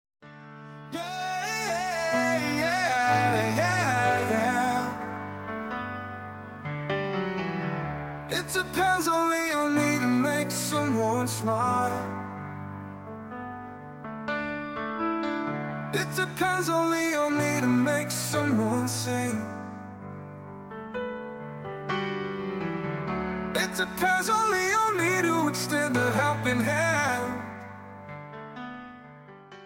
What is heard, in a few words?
Celebration